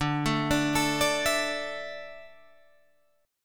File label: D Suspended 2nd D down Guitar Drop D